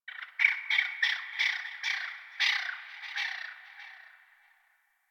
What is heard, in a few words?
Birds Medium, Ambience, Plains; One Offs; Warframe; Eidolon Plains; Eidolon Landscape